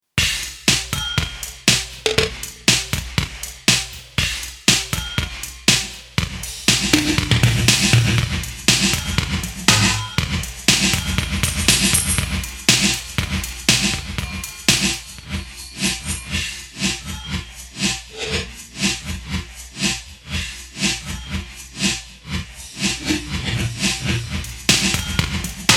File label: Yamaha SPX90 Reverse Gate Drums